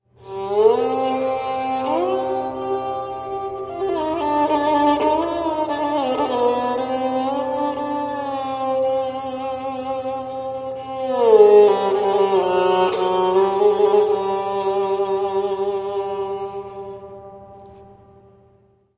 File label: esraj; Эсраж это комбинация между сародом и ситаром. Основа инструмента похожа на сарод; Эсраж популярен в Бенгальской части Индии